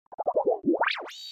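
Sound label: reverse SMS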